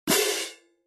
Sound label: 20in Concert Stage Pair, cymbal sound